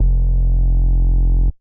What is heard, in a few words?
Bass Sub F1